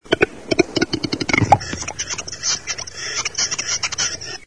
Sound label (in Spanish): urogallo, faunastur